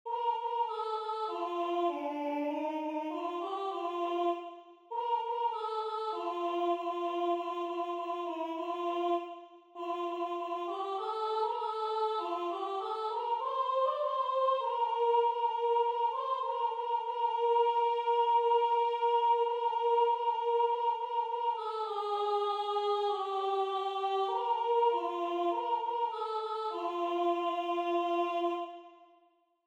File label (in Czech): sopran; ma hvezda